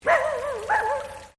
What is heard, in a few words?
Animal Dog Border Collie Bark; Various domestic dogs; Animals